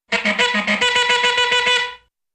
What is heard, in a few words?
Single Car Horn Honk